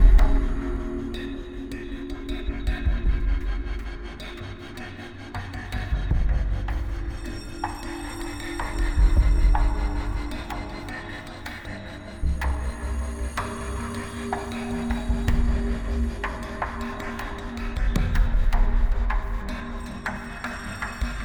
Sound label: Combat Anticipation Easy; Eden Rising